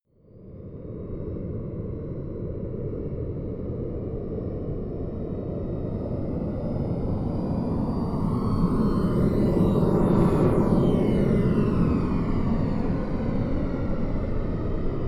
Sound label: plane honda private small flyby right to left distant